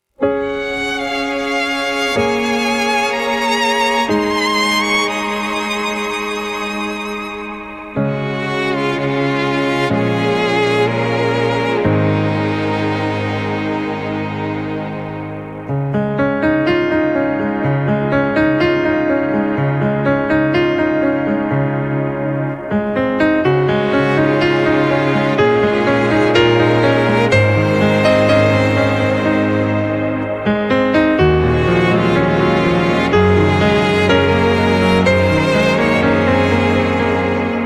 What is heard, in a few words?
Frost Elegy, cinematic